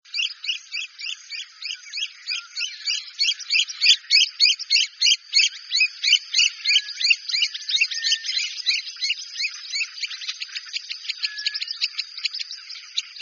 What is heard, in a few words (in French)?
anserelle naine, chant